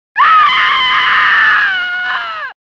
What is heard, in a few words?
scream; armageddon; jade; crashed computer meme